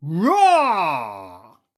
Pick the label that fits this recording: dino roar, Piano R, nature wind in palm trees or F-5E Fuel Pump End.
dino roar